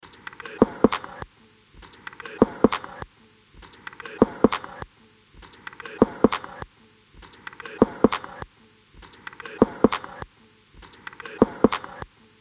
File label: Groove